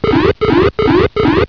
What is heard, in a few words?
bongo